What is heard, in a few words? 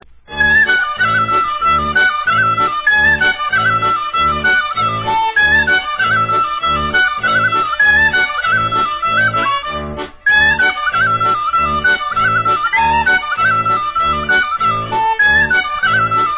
Rumanian Instrumental